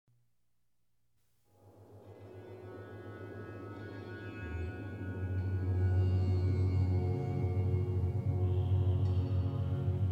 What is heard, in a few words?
Om Mani Padme Hum